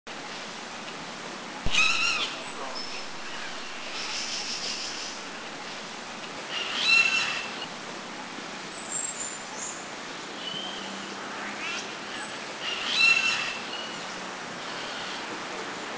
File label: Blue-naped Parrot, Philippines; Blue-naped Parrot Tanygnathus lucionensis Near-threatened (NT) Near-endemic; Subic; Good numbers seen at Subic.